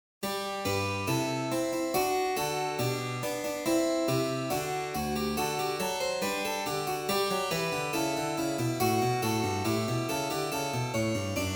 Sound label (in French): gavotte sol majeur trois voix; carbonneaux bertrand